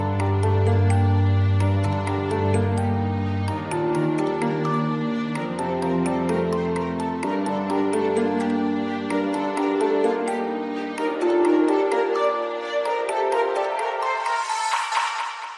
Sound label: bells flute ringtone